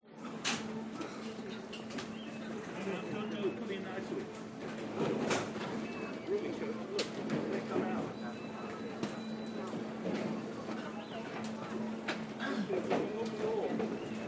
airport security people plastyic trays slide and stack scanners detectors beep busy; noi bai int